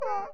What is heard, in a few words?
eraser; gcompris